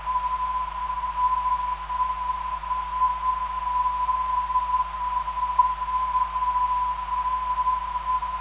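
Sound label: ZL 339kHz, non-directional beacon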